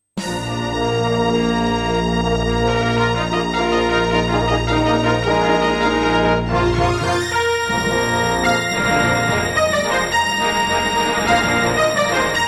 Intro, Turtle Beach Rio; Dark Forces

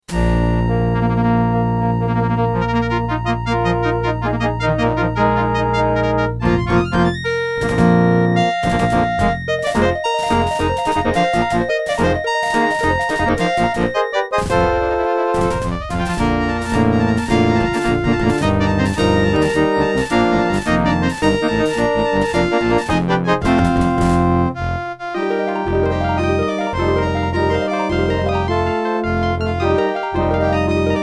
Intro, Creative SB16; Dark Forces

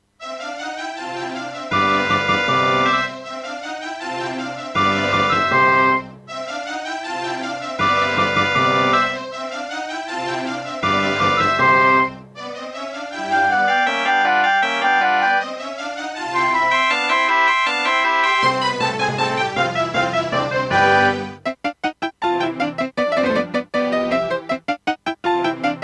Diamond Monster Sound; classical; Mozart; Concerto K467 partial

